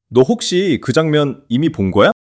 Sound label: surprise